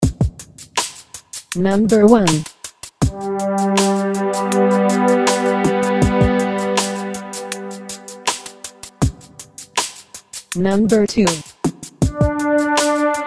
intervals m3 M3

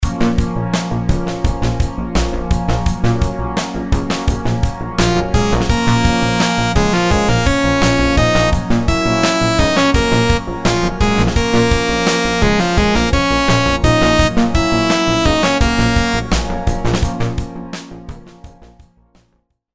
rhythms simple dotted 8s 85

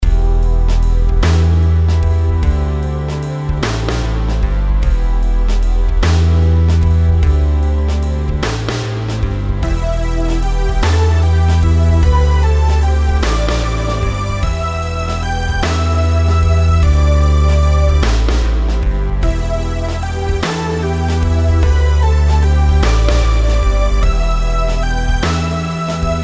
rhythms compound 8s

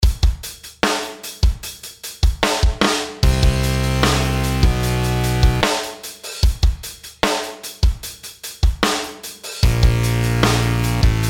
intervals

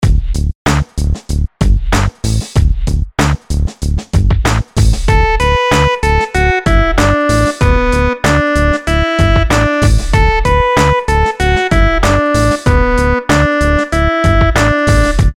melodies simple syncopated, Dmaj; melody

